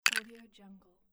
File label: small ice drop